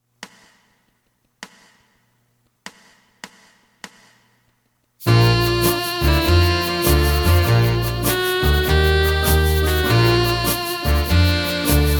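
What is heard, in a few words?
Asax 2nd, alto saxophone 2nd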